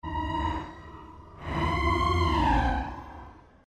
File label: created cyber whale call